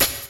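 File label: open large; REGGAE+ETHNC; Pro-Rec - Synthsonic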